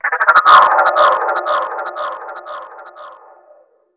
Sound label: Screech zoom